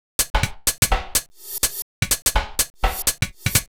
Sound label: HOUSE BEATS 125